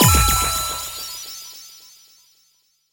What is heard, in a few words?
Retry Jingle; Friday Night Funkin Mario's Madness